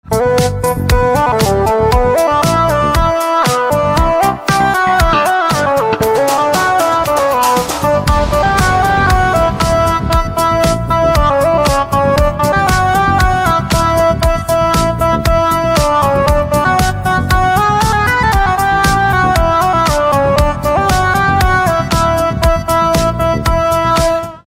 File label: Pianica